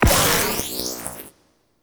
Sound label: Zariman Corruption Pickup Hostile Small; Warframe; Missions